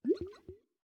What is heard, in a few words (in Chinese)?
美西螈待机声